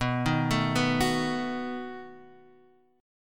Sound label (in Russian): Perebor B7